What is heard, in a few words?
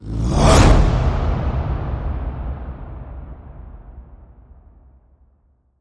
Echoes Bellow Whoosh